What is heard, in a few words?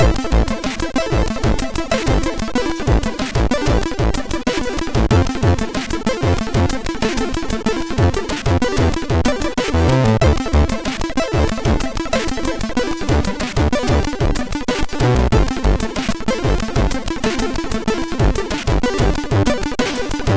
Loop Version sid MOS6581R3